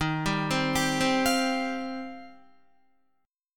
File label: Eb 6th Add 9th, Guitar; Eb6add9 arpeggiated; 10,10,12,12,12,13; JGuitar